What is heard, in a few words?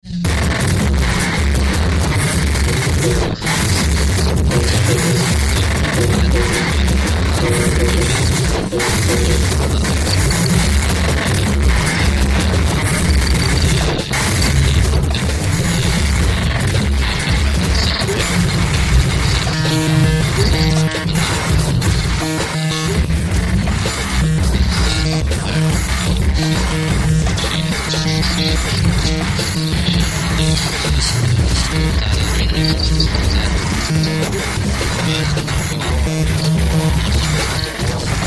micropulse